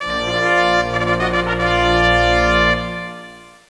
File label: trumpets